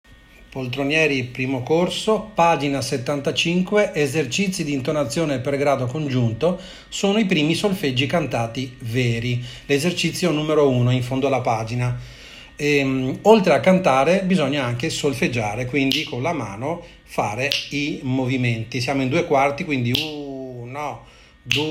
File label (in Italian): solfeggio cantar; Poltronieri 1c p. 75 es. n. 1